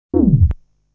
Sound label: Sounds throwing rocks; Sausage (Boss 4)